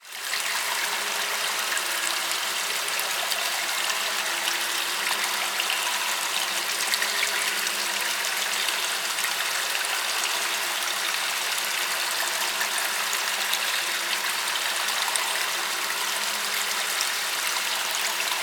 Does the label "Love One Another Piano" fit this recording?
No